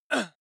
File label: male groan pain very short; menschen; schreie